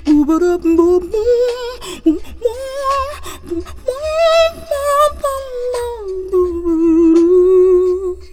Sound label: FEMALE VOX, Hip Hop